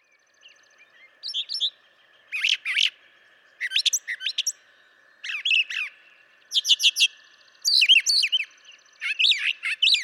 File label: Brown Thrasher